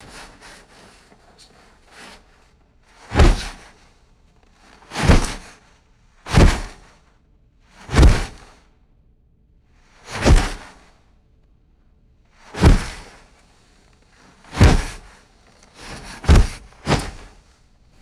heavy plastic tent material pulled and shaken; cloth

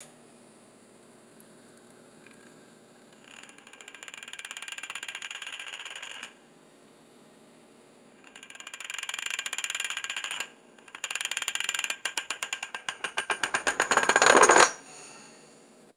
roulette ball spinning and dropping; game

